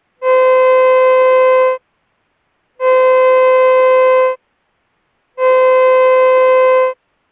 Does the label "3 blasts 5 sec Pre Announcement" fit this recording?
Yes